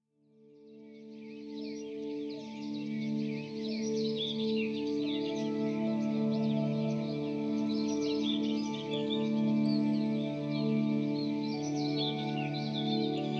White Noise Zen Meditation Sound Classical New Age Piano Music White Noise For Baby